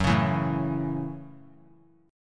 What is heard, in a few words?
Gb Minor 7th; strummed; JGuitar